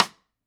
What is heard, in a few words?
snare